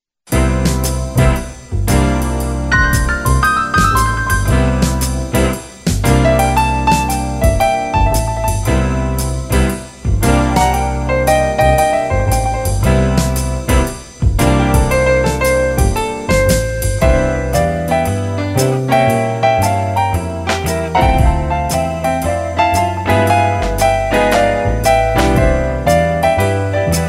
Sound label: swing piano